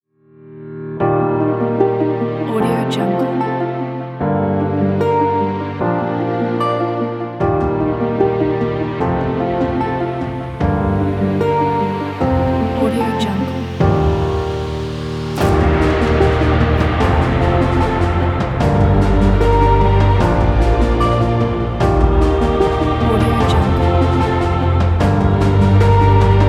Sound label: inspiring violins